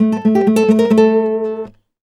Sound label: Flamenco, Guitar, a